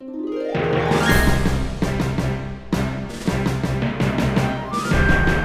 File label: battle intro